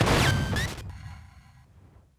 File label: Bolter Attack